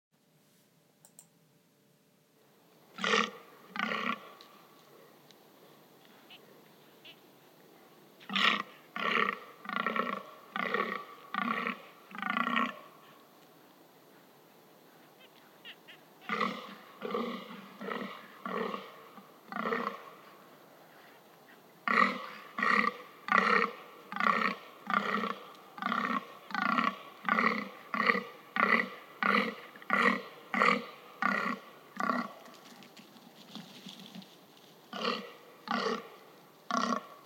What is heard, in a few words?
fallow deer sounds